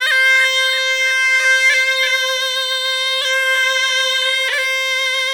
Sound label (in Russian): Oriental, медные духовые инструменты